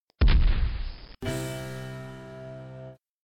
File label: blast game end